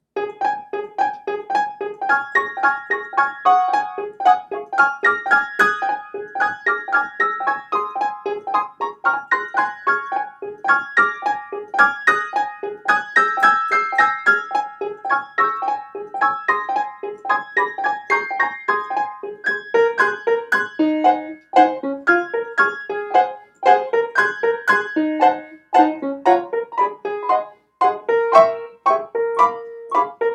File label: piano improv Db maj; Noam D. Elkies piano improvisations